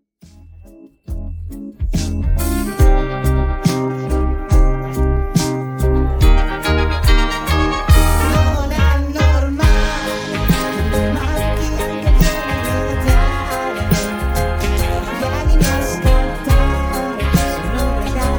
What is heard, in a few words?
Slow Fox